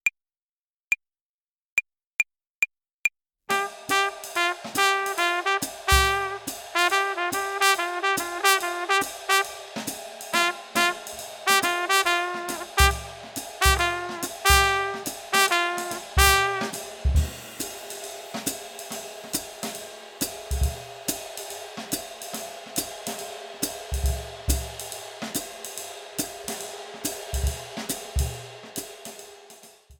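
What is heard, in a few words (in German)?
Swing Phrasierung Übung; play along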